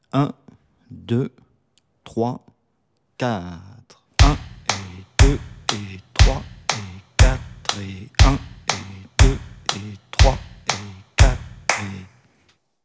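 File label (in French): methode pour travailler un rythme 060bpm, guitare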